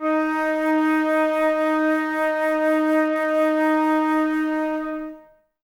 Flute, Woodwind; Miroslav Vitous - Woodwind Brass Ensemble; 90's Sample CDs

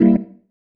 Stab One Shots, Organ

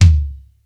TOM-L; Crypton - DrumBase5000

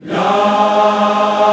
Ya, African Aahs, group; Roland LCDP11 Africa VOL-1

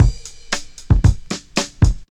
house loop

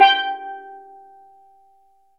steel drum

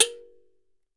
KALIMBA; E-MU Producer Series Vol. 7 – Old World Instruments

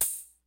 FUNK, DRUMS; Kid Nepro; Elite

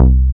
BAS, Eurotech; Sounds Good

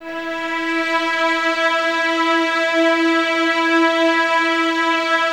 Violins E 4, String Ensembles; Light; Miroslav Vitous; 11 Violins

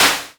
hat, Roland MKS-80 Drums

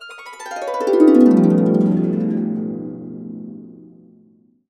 B, Harps; USB Soundscan vol.62 - Complete Harps; AKAI

